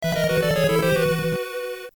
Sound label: death